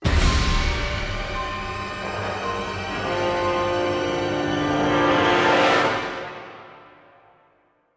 MUSIC STING; LVL 11 NEUVACITY A

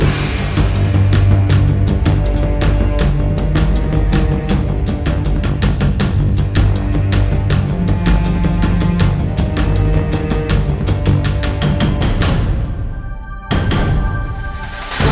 Music; cartoon network punch time explosion xl 2011 gamerip

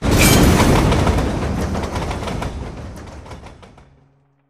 train boss defeated

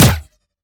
Sciweap Shot Small Gun Bolt Colt

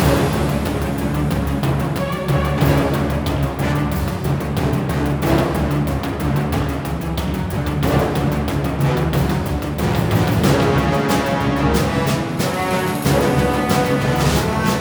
Trenches And Tanks (Short Loop); Arc Savior

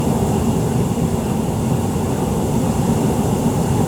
Fireball loop

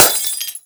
Light Bulb

